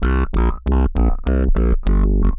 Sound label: Roland F Line, Roland S100